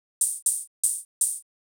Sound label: ohh; hydrogen